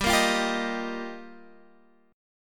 G Minor 7th Sharp 5th, Guitar, Drop D; chordshape; down

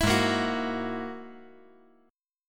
G sharp 7th Suspended 2nd Sharp 5th; G#7sus2#5; strummed; 9,8,6,9; JGuitar

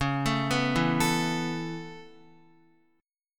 Db Minor 7th Sharp 5th Db down Guitar; arpeggiated; 4E,4A,5D,5G,5C,6F

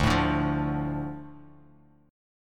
D Minor D Bass Standard; Dm strummed; 10,12,12,10; JGuitar

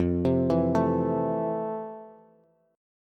F Major 7th F, Bass; arpeggiated; JGuitar

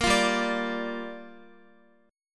G Minor 7th, Guitar; Gm7; strummed; x,x,10,10,7,10

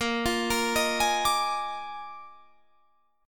Bb 11th, Guitar, Drop D

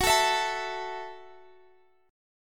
F sharp Minor 7th, Guitar, Standard; F#m7; strummed; x,21,19,21,22,x; down; capo=3

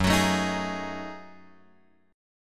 F sharp Minor 6th Add 9th, Guitar; F#m6add9 Chord {2 X 4 2 4 4} strummed

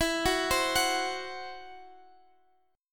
E Suspended 2nd Sharp 5th E, down; Esus2#5; arpeggiated

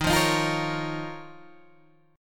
D sharp 7th Suspended 2nd, Guitar, Open G

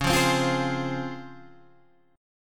C sharp Major 7th C sharp, Guitar Standard; C#M7 strummed; JGuitar

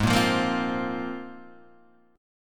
C 7th Suspended 4th Sharp 5th

